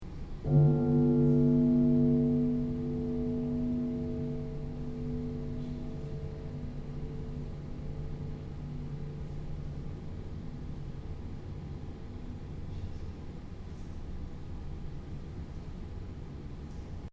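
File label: Piano hit with timpani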